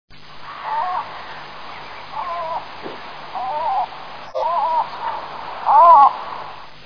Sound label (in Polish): uhla, głosy